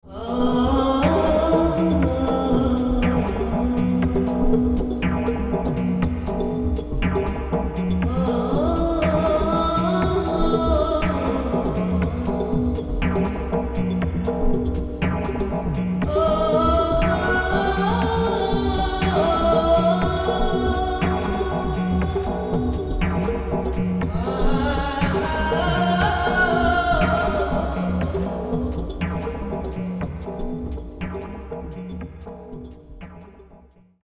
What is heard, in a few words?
Syrian Voice